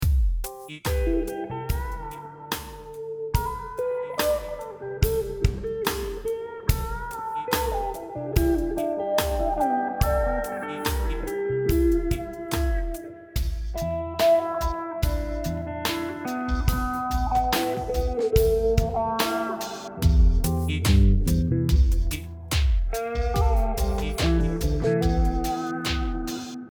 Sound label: slo funk cue